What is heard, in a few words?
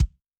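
bass drum dry, Kick, Yamaha DTXpress-II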